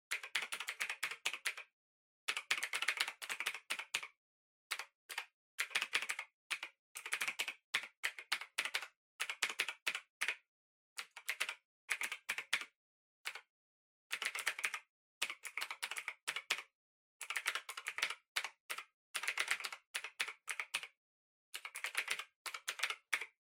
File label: PC Keyboard Far Mid